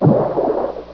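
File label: WATER GUN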